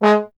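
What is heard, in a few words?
bass trombone G1; Miroslav Vitous; Solo Instruments VOL-1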